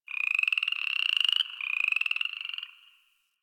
Night Zone Tree Critters, Ambience Plains

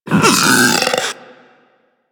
Infested Splitter Split Top Vocal Attack Medium

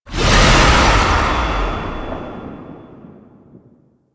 Impiety Impact; Warframe; Warframes; Dagath

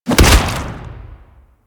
Infested Jetpack Charge Land; Warframe

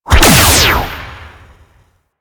beam attack shot; Enemies; Warframe; Vomvalyst; Teralyst; DE

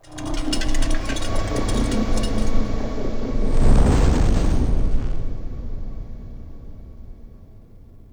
Rise Start, Throne Room; The New War; Sentient; Erra

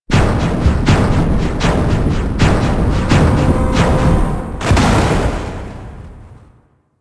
Defeated Explosion Train; Warframe; minigames; Caliber Chicks minigame; boss

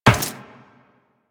Run Metal Perforated, Footsteps; Warframe; Tenno

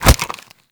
Infested Bone Blade Scatter Shot Proj Impact A; Warframe

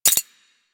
Greed Point Drop; UI; Pickups; Warframe

